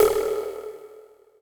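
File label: snare drum Funk Verb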